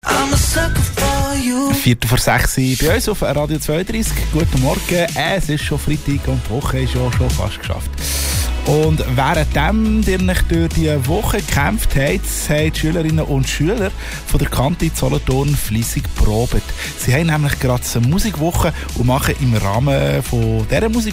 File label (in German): Orchester und Chor; musikwoche musical flug in die zukunft; Radio32